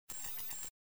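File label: signal glitch; افکت صوتی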